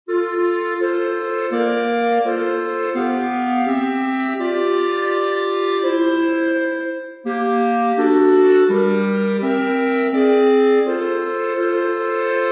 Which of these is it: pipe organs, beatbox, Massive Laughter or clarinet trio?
clarinet trio